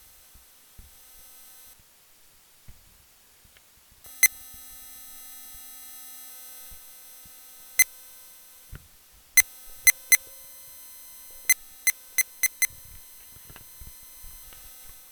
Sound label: Digital Clock